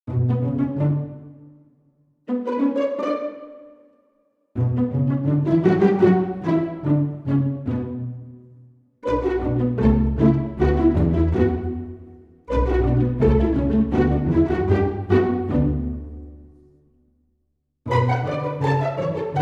pizz; archibald editions

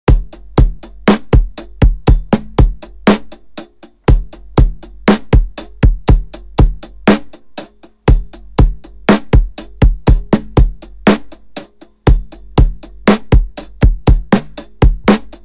120 TAPE SAT LOOP

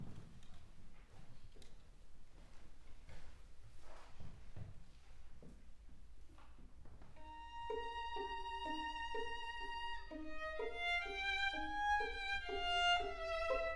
String quartet

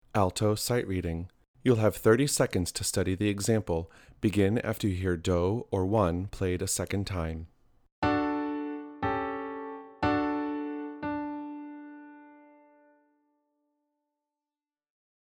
SR Alto in D

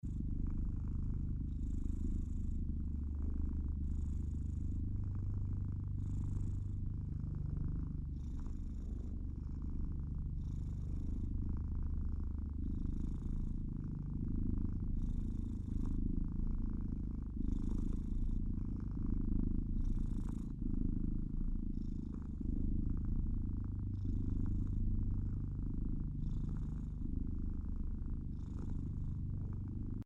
Purring, 24Hz

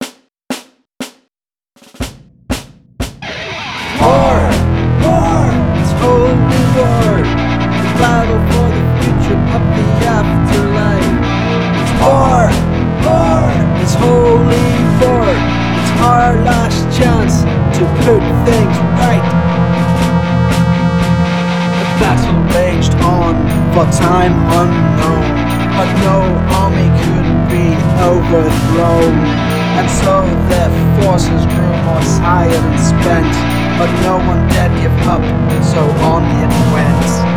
120 BPM

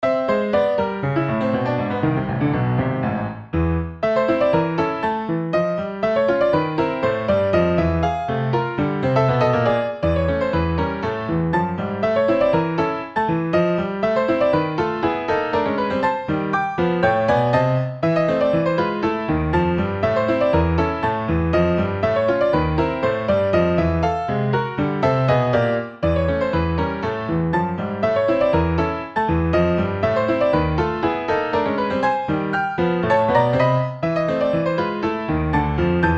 Ragtime

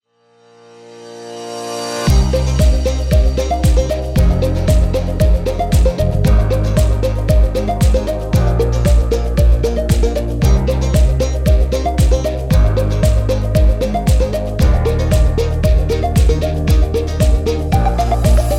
Upbeat

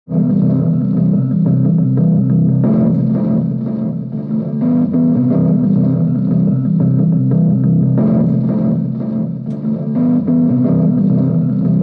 short rhodes

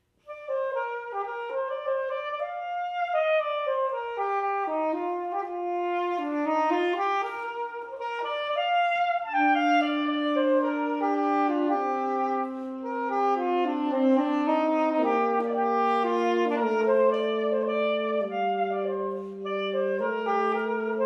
Courante

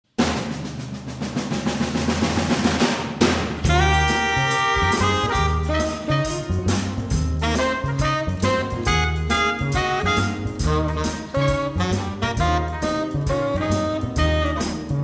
Live Jazz

